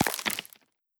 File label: Cruch Bones